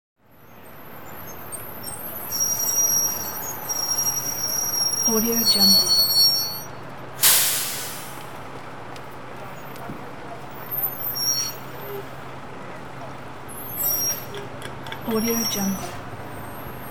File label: city bus brakes squeal